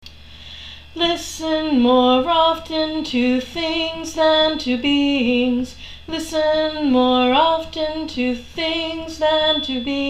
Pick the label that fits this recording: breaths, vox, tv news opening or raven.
breaths